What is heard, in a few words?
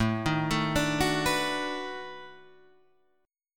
B 7th Sharp 9th, guitar